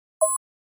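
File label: Flash Beep Hollow Electronic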